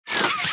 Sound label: hand retract